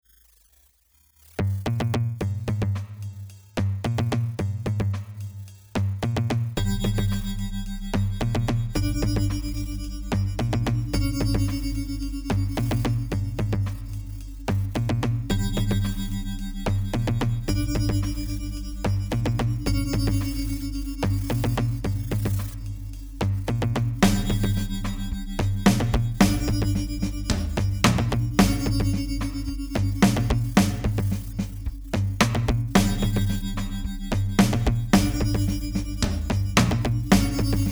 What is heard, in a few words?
Pian Instrumental